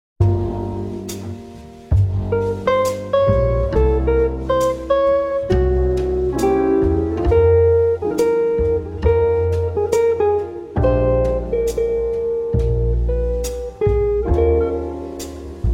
Atmosphère Calme

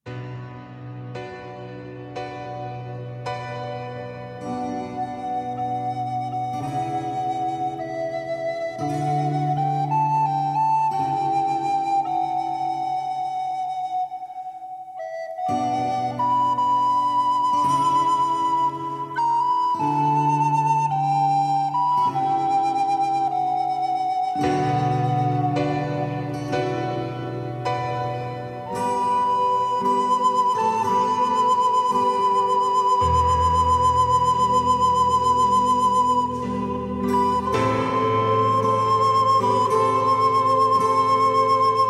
Concerto en Mi Mineur Instrumentation Contemporaine

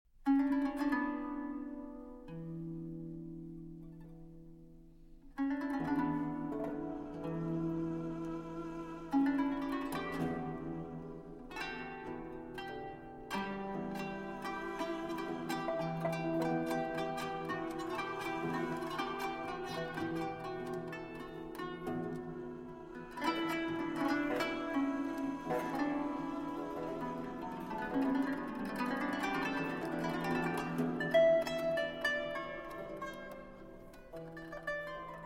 taksim, kemence, kanun, oud et tanbur

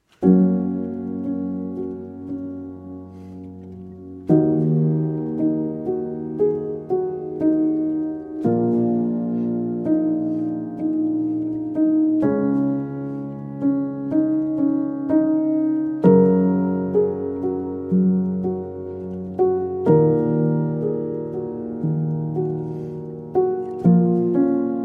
Improvisation

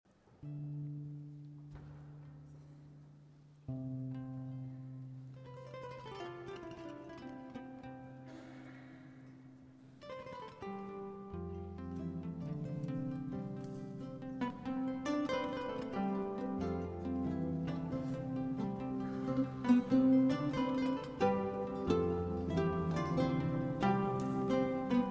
Chant de griot

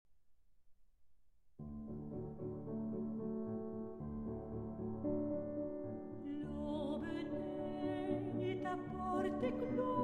Chanson d'amour

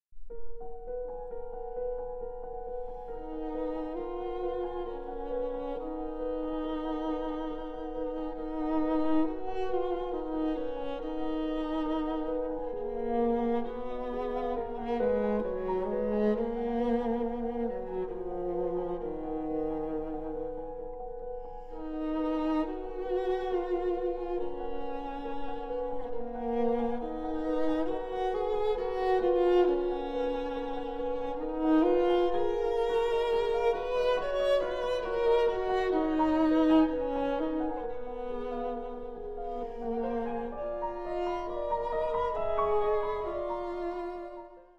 Alto et Piano; I. Modéré; N. Boulanger Trois Pièces Pour Violoncelle et Piano

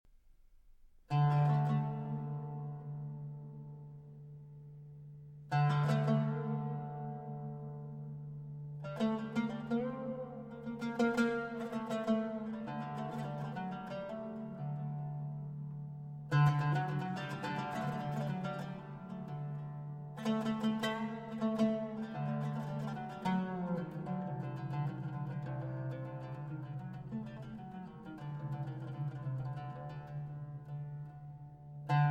prière, chant spirituel, kemençe & oud, traditionnel hébraïque

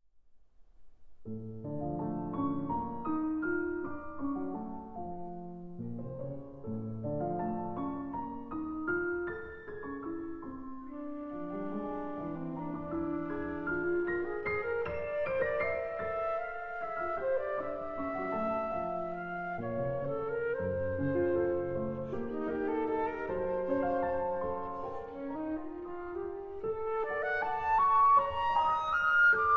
Sonatine Pour Flûte et Piano, Allegretto - Andante - Animé

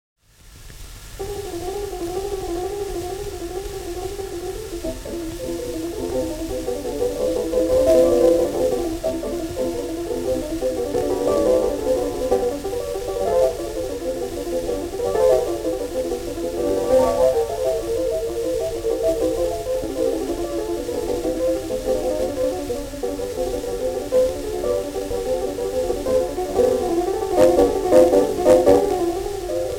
Valse Chromatique

